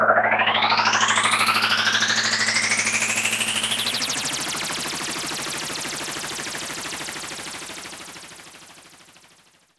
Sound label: SYNTH FX